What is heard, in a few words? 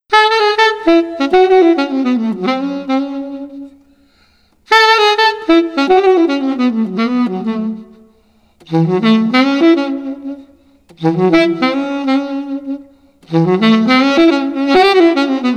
Street Sax Mellow